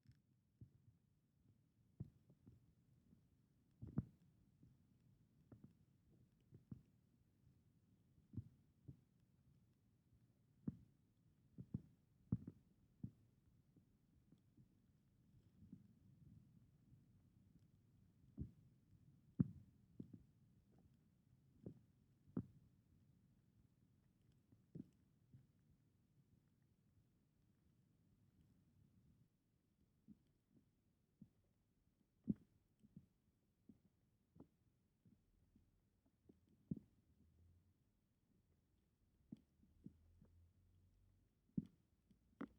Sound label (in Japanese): 心音 左耳 カット無し; 声のみ